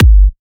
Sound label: Bassdrum